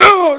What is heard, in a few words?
hit; BattleSNDS